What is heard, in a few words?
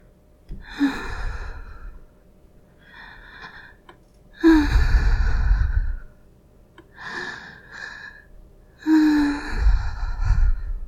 just moans